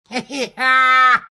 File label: alch laugh; dota; alchemist